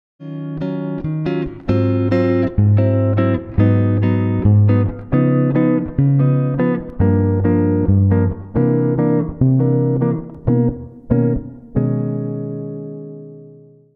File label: Godin switch chords spezial